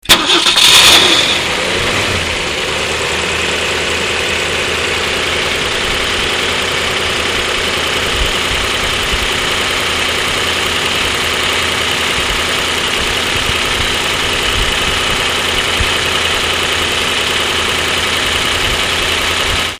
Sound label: 1965 ford galaxie 500